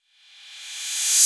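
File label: 808 cymbal reversed; Wizoo; Platinum 24 Electronic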